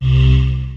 Deep Breath; instrumente

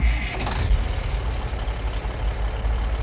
Engine Start

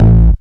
Ordinary Tech Bass

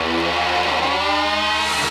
Guitar FX

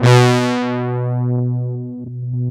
Fat Synth Lead; instrumente

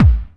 bd room; percussion

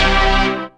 dance chord

